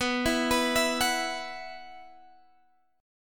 B Suspended 4th, Guitar, Drop D

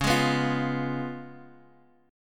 Db 7th Db down Guitar Open G

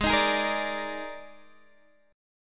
Eb Major 7th Suspended 4th Ab

